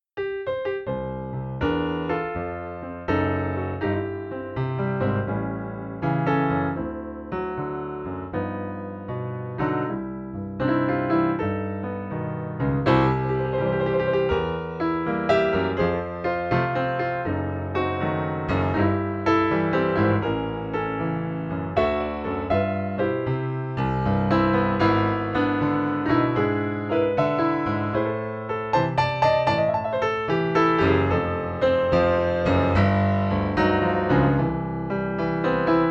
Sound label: piano no external effects; Pianoteq